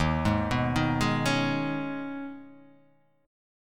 Eb 7th Suspended 4th Sharp 5th Eb; arpeggiated; chord

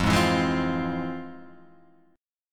F Minor Major 7th, F, Guitar, Open G

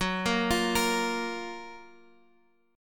Gb Major 7th, down, Guitar, Half Step Down

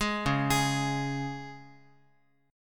Db 5th Db down Guitar; Db5 arpeggiated; JGuitar

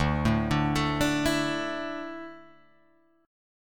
D Suspended 2nd D down Guitar Open G

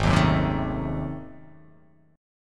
G sharp 7th Flat 9th G sharp down, chord

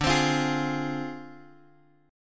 D Minor 7th Flat 5th, D, down, Guitar DADGAD

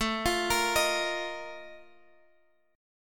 A sharp Major 7th Suspended 4th

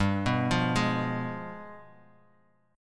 G 7th Flat 9th G down, Bass Standard; arpeggiated; JGuitar

